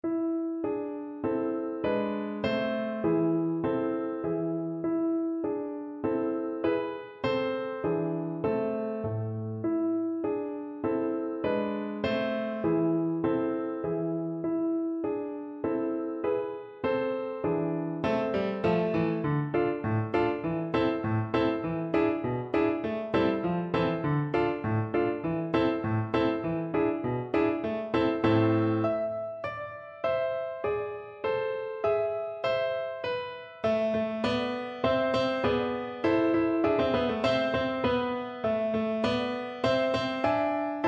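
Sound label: viola karaoke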